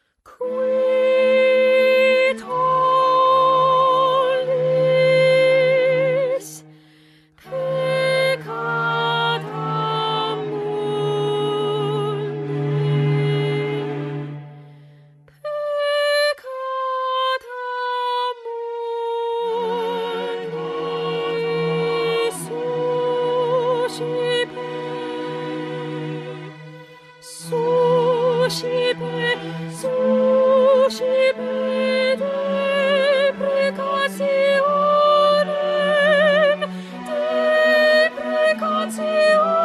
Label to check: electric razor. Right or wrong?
Wrong